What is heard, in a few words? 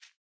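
Footstep Grass; Boomerang Fu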